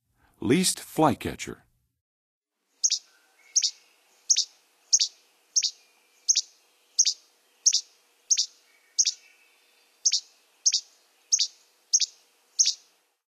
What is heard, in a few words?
Least Flycatcher, Songs of Birds of North America; Western USA; Western USA Songbirds